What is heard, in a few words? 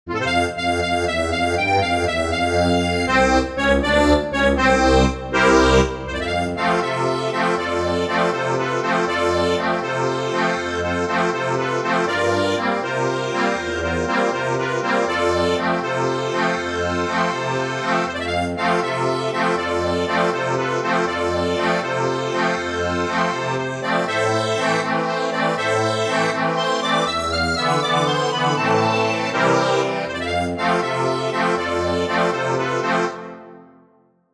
accordion, sheet music